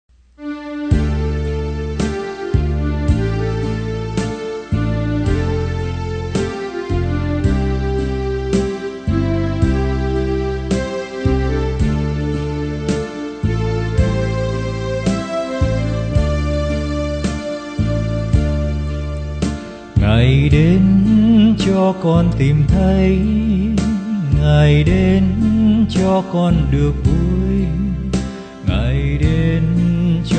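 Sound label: soprano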